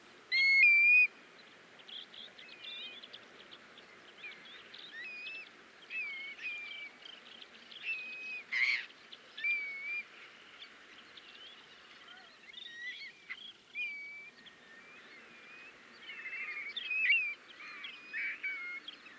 Grey plover

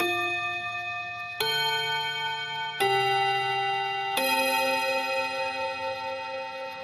Japanese School

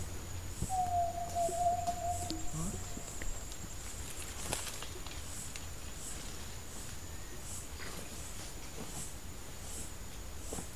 Yellow legged tinamou

